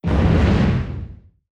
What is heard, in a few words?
distance spikespore hit, weapons; Stellaris